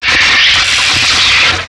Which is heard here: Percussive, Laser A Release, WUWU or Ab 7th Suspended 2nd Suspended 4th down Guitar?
Laser A Release